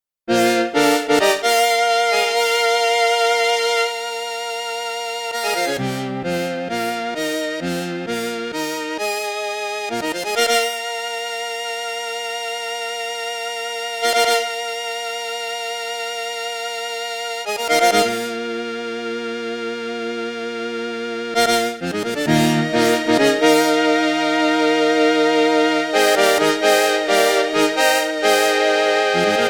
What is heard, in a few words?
daytime brass